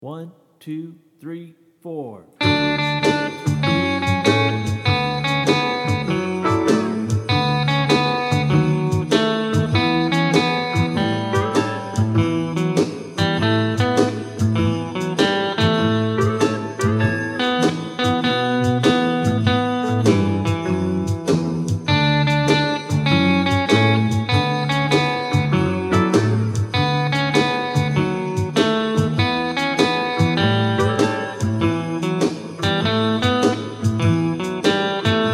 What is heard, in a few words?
Slow Play Along, guitar; song demonstration